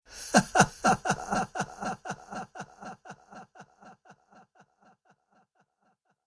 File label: Ha Ha Ha Laughing With Delay; Laughter Sound Effects